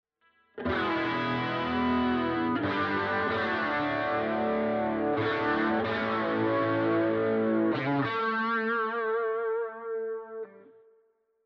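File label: DUAL CUBE Hi Gain Stack with Chorus Reverb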